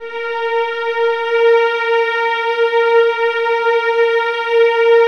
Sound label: VIOLINS C L, Synclavier Strings; ILIO